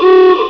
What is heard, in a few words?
Wrong; MacEmatics